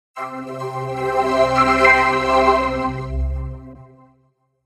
in Standartd Sample, Vocoder